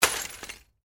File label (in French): bris verre